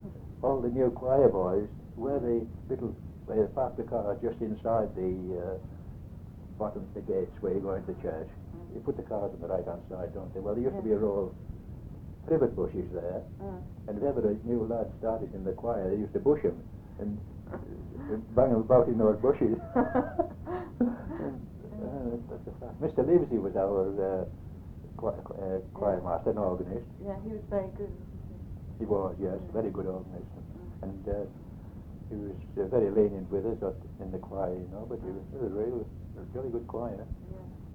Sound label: Choir; audition